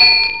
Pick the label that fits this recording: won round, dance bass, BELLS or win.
BELLS